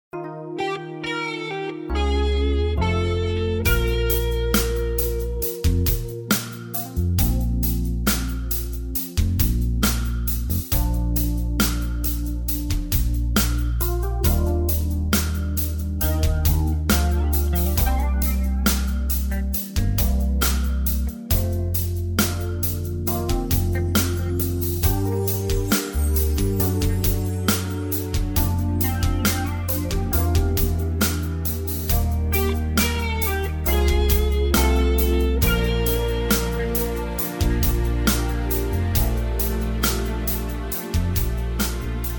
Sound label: (Bb) No Backing Vocals